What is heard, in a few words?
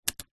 comb plastic set down on wood table; household